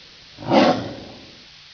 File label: roar; colosseum